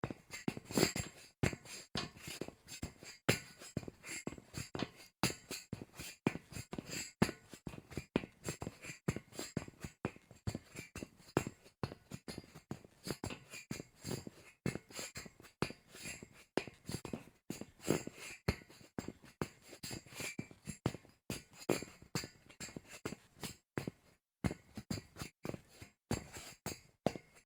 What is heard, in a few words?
scuff on metal grate